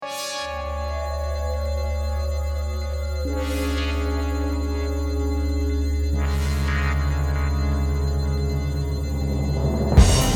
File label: electro; re4orm